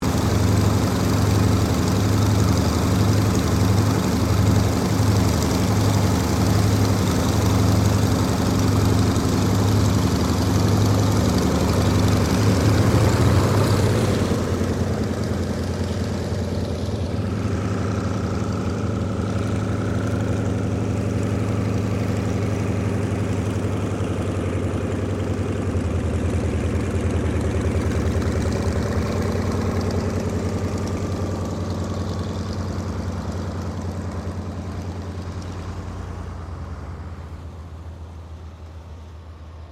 AIRCRAFT YAKOVLEV YAK-52 IDLE TAXI, Training aircraft; Russian Yak 52 Training aircraft; Airplanes; Vehicles